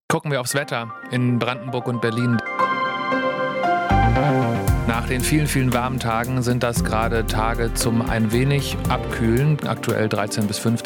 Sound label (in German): Wetter; swr3